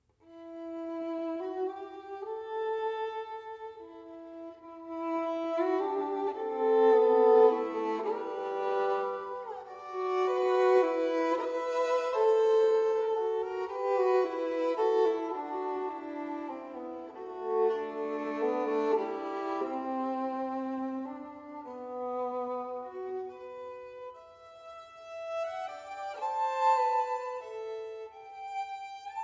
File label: Sonatas For Violin Solo, Sonata No. 2 in A Minor - II. Malinconia; Ysaye; Op. 27